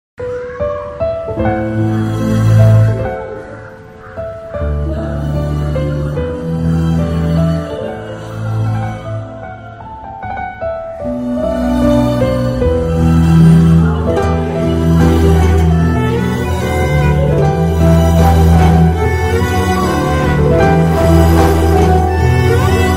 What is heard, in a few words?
heart breaking love music bgm